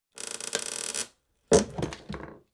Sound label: Nail Pulled; Construction; BBC Sound Effects Library